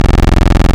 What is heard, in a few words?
Modular 3 VCO Pulse, wavetables; Animoog